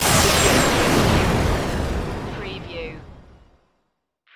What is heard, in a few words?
Magical Shimmer Blast Whoosh; magic spells fantasy; lynne-publishing